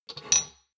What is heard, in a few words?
shot glasses pull out slide medium, gastronomie; berufe; soundpool